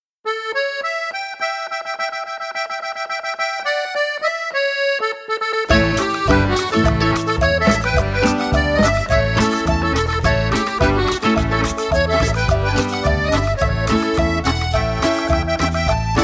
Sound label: Cafe Accordion Orchestra